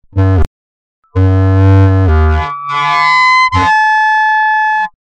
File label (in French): Anche Tuyau